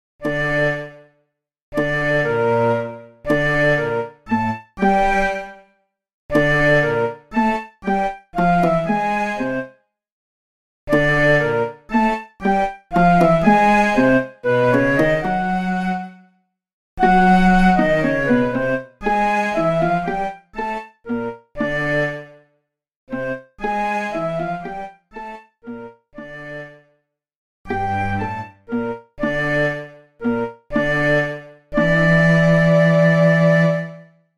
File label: Allegro, Flute, Viola, and Violoncello; 5 short pieces